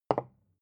bottle put down

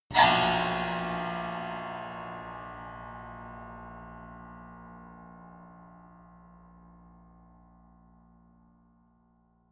piano squeal

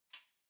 cutlery knives handle short

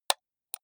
computer mouse left click single slow hard

